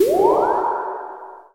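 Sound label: digital ascending active button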